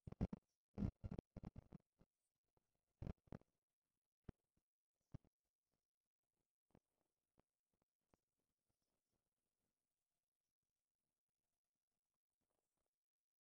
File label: guitar